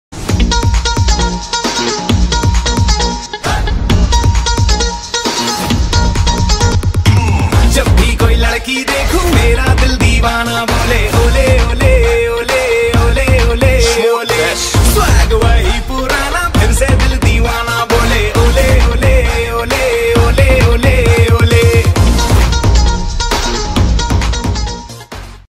ringtone song

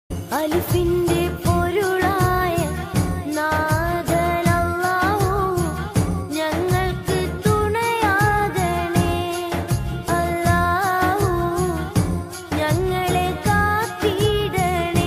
islamic malayalam ringtone

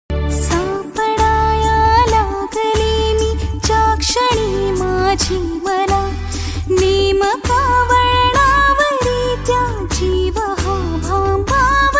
marathi songs

